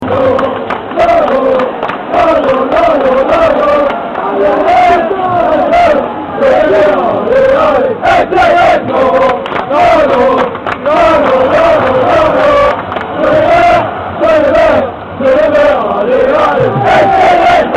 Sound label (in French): Jaune et Vert, Chants; Nantes; FCN